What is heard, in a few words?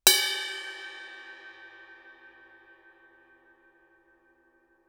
crash stick bell ff stereo, Suspended Cymbals; Percussion